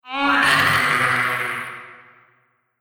Hatchling Devil Creature, monster

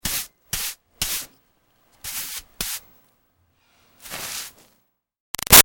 Foley Brush Dirt Archeaology

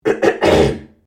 MALE CLEAR THROAT; Clearing Throat; Human

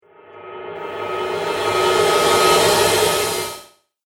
Reverse Cymbal Whoosh

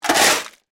Stones Shovel, Industry, Stones, Shovel, Scoop; Yard Tools (Sound Effects:Tools); BlastWave

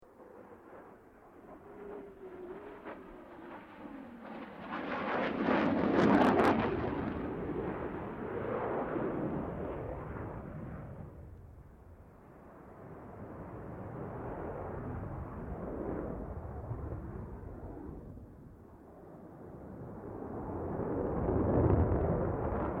Airoplane Jetfighter Maneauvers, Airshow; Avalon Airshow 2005; Airplanes; Vehicles; SoundEffects-Foundation1

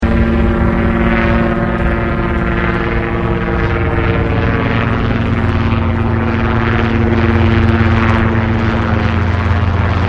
HELICOPTER PASS OVERHEAD; Various unknown Helicopters; Vehicles

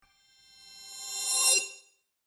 Fairy Sparkle Whoosh

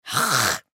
Human Spit; Spit Male Ten Yrs Boy; Human Spit Male Ten Year Old Boy; Spitting; Sound Effects:Human

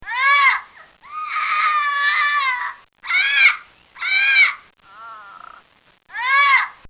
South American Parrot; Birds; Animals; Bobby Cole

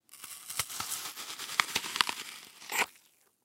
Human Bite Food; Bite Food Apple Slow; Eating; Blastwave

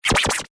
Frequency Alien Radio; Alien Sound Effects; science fiction sound effect

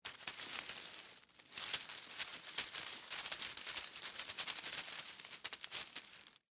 Insect Walk Leaves, Foliage, Movement, Bug; Animal; Insect Movement Sound Effects

